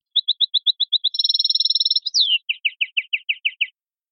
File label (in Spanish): canario; campero